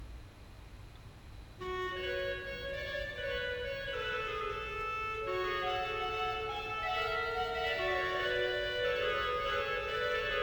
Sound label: dagincour trio